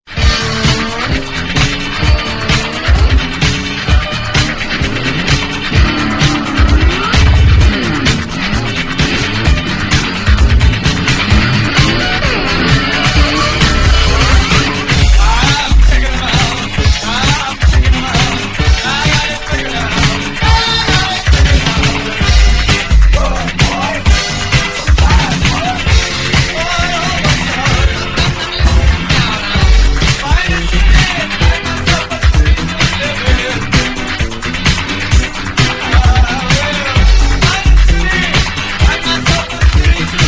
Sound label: Elektro